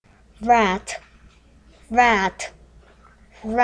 rat

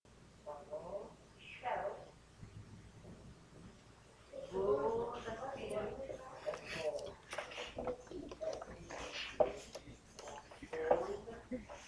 groan

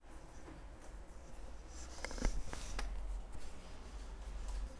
purr

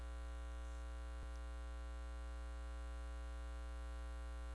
lid

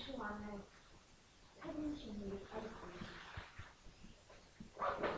scratch

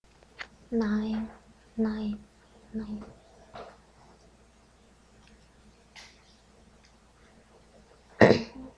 knife

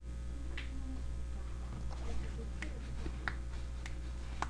pop

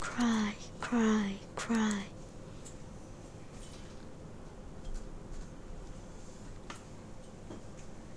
cry

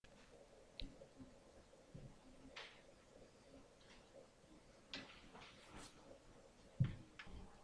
elephant

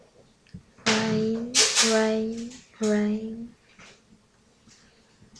rain

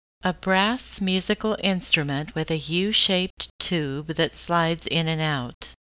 trombone

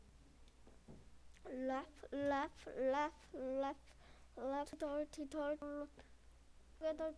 laugh

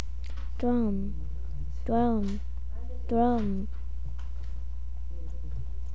drum

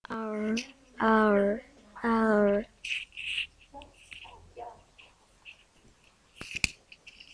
owl

